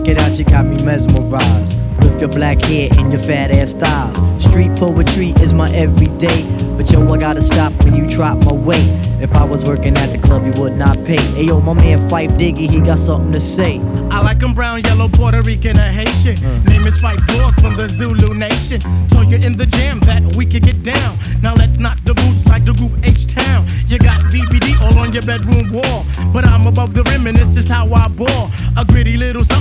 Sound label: hiphop